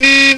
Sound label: Car Horn